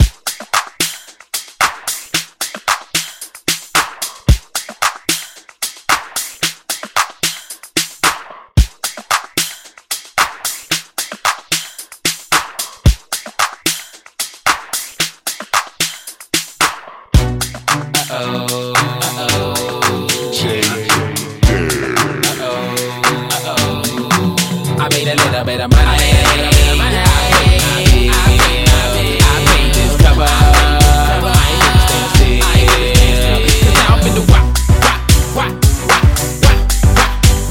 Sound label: Hip Hop Redrum; Remixfreakz